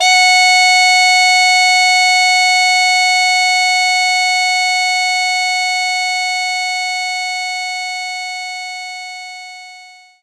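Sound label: Baritone Sax A#3; knights try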